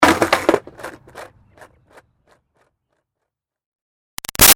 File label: Skateboard Drop Crash Rocks Back & Forth; Skateboarding; Sports; Big Room Sound